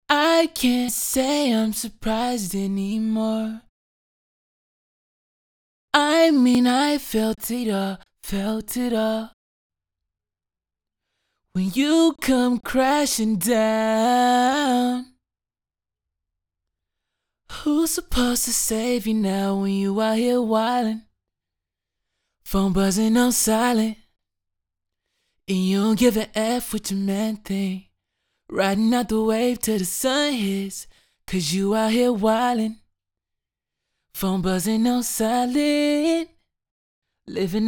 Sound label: Raw vocals Lead vocal; FL Studio